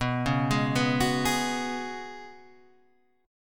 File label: Gb Suspended 2nd Suspended 4th B